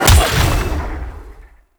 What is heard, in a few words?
Melee Jump Slam Impact, Whooshes; Weapons